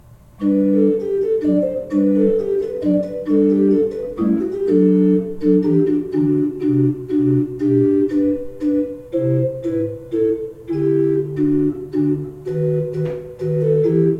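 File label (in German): Baltes 6 I, pfeifenorgeln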